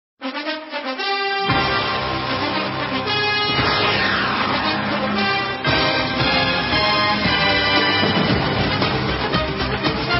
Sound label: Winning Tone